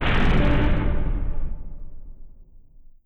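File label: Collision Interior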